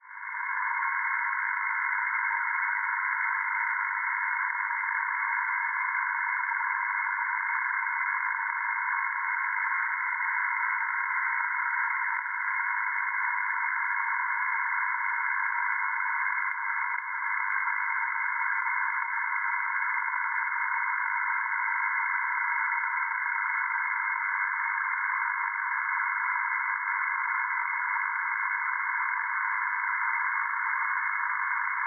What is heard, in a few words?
NDB Cres Croazia 433 kHz; Perseus; Schwarzsee